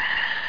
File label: cricket; roc